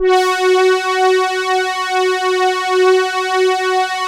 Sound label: Elka Synthex Brass